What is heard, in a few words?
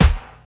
snare sample D key